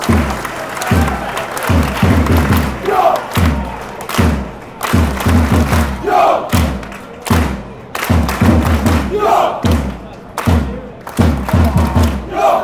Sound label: drum clap war cry